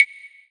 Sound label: Roland SC-88 Claves